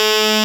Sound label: PPG 2.3; DIGITAL1 HYB